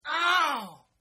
Oww Male; Exclamations; Voice

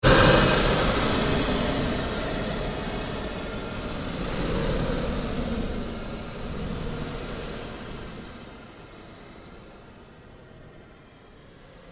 Created Pulse Long Sweep, Sweeper Sound Effects; Sound effects created by manipulating other sounds

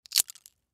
Potato Chip Break, Potato Chip Break Crunch; Potato Chip Break Crunch Junk Food; Household; Foley; Blastwave

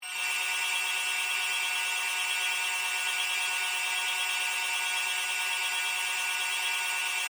Scanner Beam Ray, Scifi

